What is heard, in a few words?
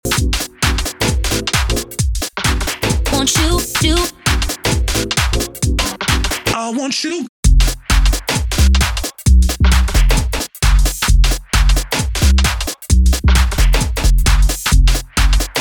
so confused no vocal